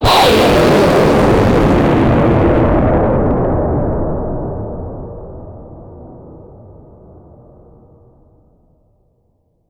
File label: a blackhole vortex that